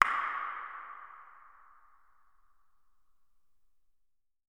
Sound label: Mouth Click Reverb; 99Sounds; The Warehouse